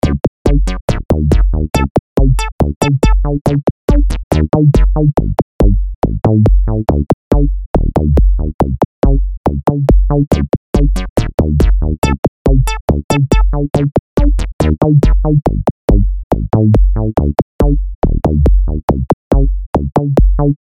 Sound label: penguin dance